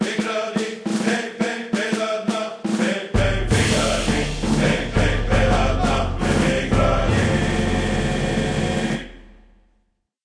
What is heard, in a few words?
Rebel Win; project reality bf2 1.6